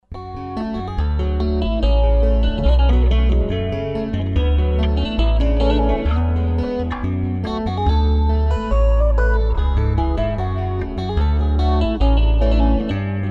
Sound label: Stick